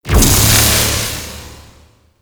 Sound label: Betrayal Beam; Warframe; Warframes; Pax Duviricus